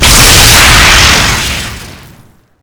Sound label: dragon breath lightning long